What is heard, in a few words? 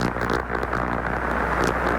Substation 60Hz Partial Discharge Sound